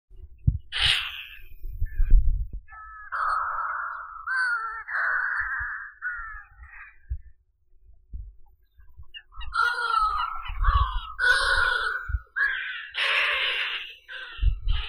burevestnik levantiiskii puffinus yelkouan

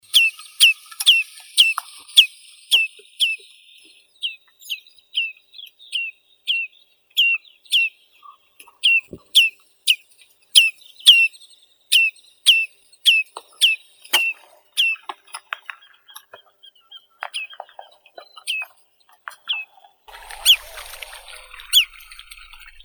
porucheinik tringa stagnatilis; zvuki prirodi

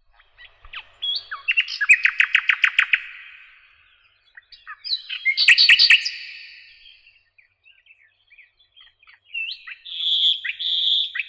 solovei obiknovennii luscinia luscinia, zvuki prirodi

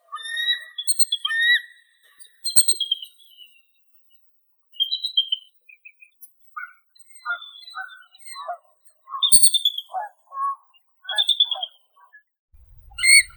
orlan belogolovii haliaeetus leucocephalus

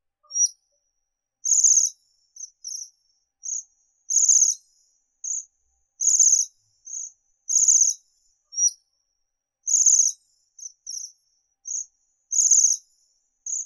zvuki prirodi sviristel amurskii bombycilla japonica

